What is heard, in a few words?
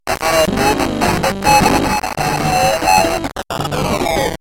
computer glitch